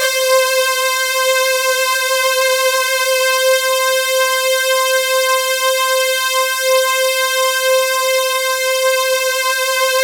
C4, Synth Line; 90 s Sample CDs; NiceBeats; SynthLine VOL-26

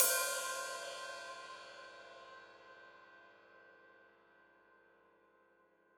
Hat Open, Hi-Hat; Deeply Sampled Drums

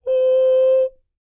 HUMAN TRPT, Jazz FX; Spectrasonics; Vocal Planet

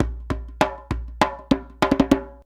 100 JMBSOUL; Univers Sons; Basicussions

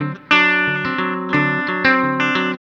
COUNTRY

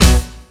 FILL HIT; Ragga & Dub Reggae; AKAI; USB Soundscan vol.27; FILLACOUS

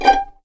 G5 PIZ MF, string; East West; The Ultimate String Collection

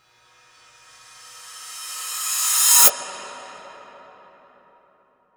IMPACT SCARY, Cinematic Impact

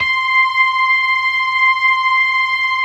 LO MANUAL VIB, Organ; InVision Interactive; Keith Emerson; The Most Dangerous Synth and Organ

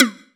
Perc

